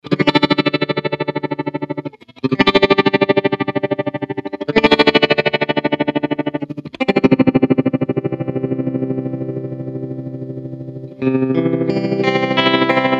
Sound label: sweeps